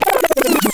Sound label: DANCE FX; Best Service; XXL Production Effects; Sample CDs